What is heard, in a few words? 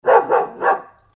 dog bark